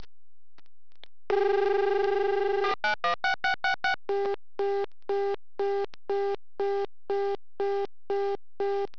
e10b fast busy

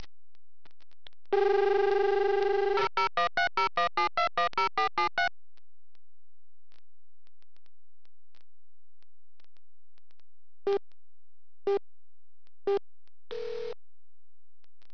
5ESS or DMS in USA ring

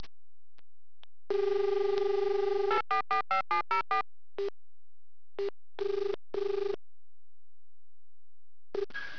new AXE10 ring

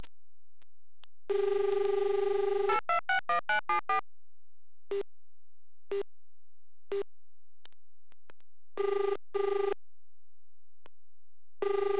fetex ring

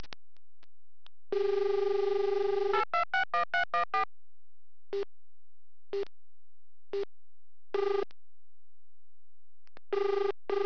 fetex ring

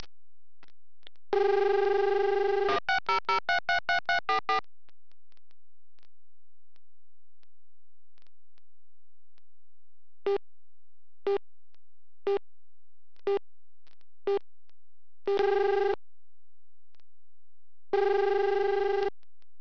toll free e10b long ring

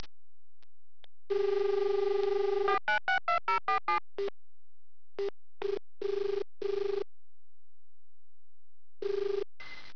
EWSD ring

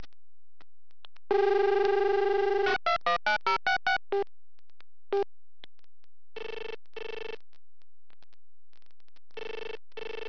DID ring

